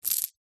household jewelry necklace silver charms place into hand; zapsplat